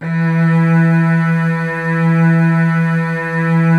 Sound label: Virtuoso Strings; ILIO; Kirk Hunter Virtuoso Strings VOL-3; 90 s Sample CDs; CHMB STR ESP